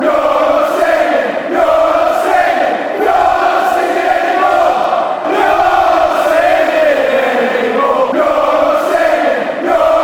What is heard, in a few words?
youre not singing anymore fanchants